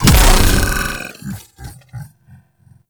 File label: Devour Regurgitate Impact; Warframe; Grendel; Grendel Deluxe